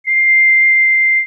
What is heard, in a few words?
trumpet